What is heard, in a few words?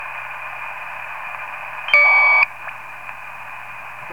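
144.800 no squelch digital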